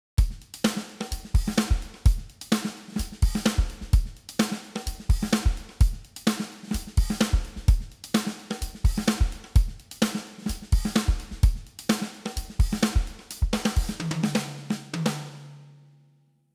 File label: COMPRESSOR DRUMS ON